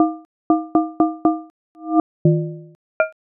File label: studio transformations, wavetable synthesis